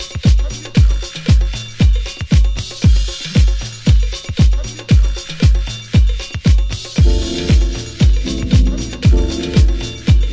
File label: DOWNBEAT